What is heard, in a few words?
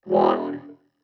Neo-Wave Vocoder; Pop Rock; Pro Tools Essential Demos; Digidesign; Essential Loop Demos; Demo Session - Pop Rock